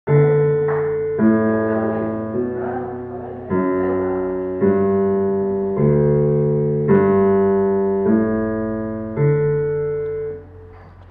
Piano

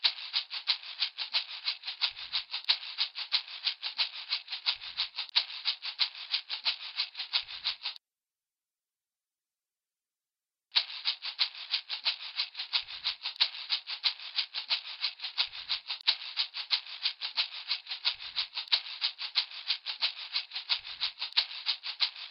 maraca

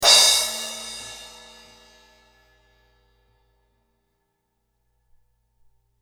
Crash

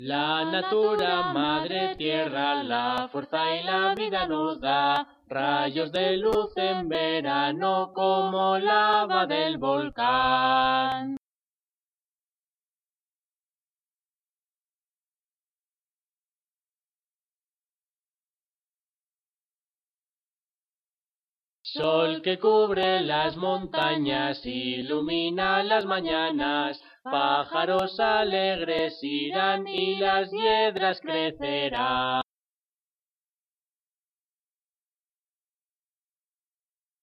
Voices

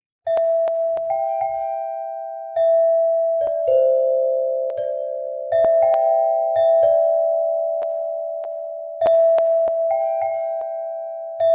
xilophone

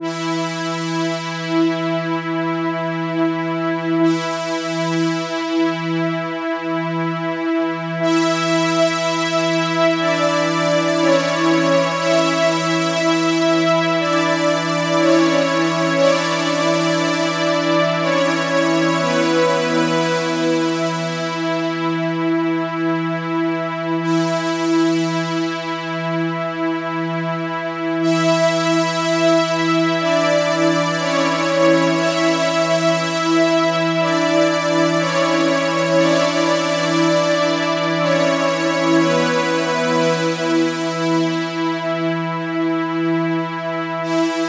cheese strings

